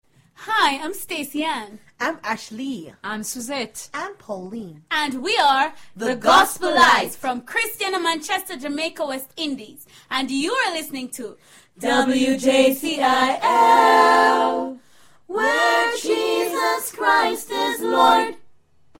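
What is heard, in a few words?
Gospel light jingle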